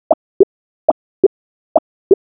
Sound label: emoticon